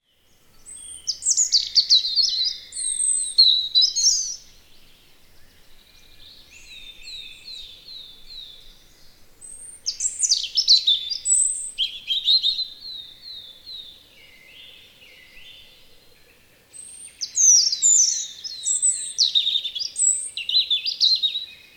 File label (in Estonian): punarind; spring